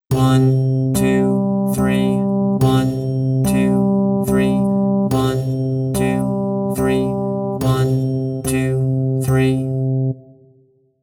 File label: example of triple meter